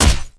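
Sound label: crowbar hit body; weapons; cbar; tf2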